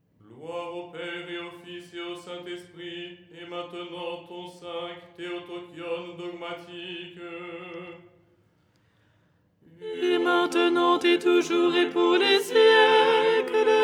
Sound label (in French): alto; " Gloire… et maintenant… "; Les 8 tons en français